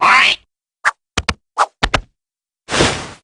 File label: cat rest; simon